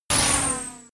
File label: sci-fi laser shot